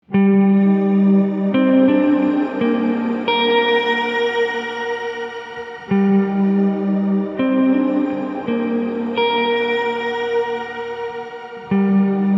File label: shimmer, big sky